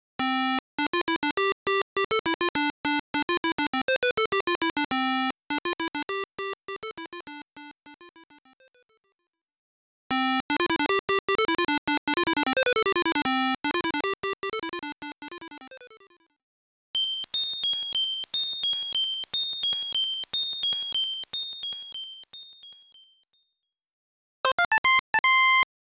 Normal BGM ~ Pinch BGM ~ Diamond Appearance ~ Clear